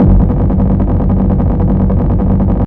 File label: bass delay fx; EZRollers